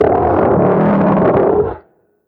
TIGER TRESHER idle

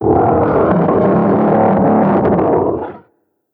attack; TIGERTRESHERb

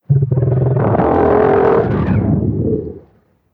TIGER TRESHER death

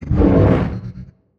damage; ENDWORM

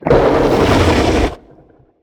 damage; SPINELINGGIANT

